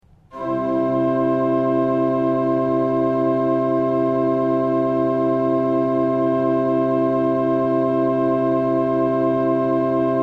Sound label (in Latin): principal 8 + vox humana 8; srednja vas